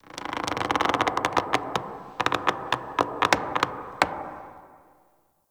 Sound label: Root Cave Root Creak B; Ambience; One Offs; Neathuns Root Cave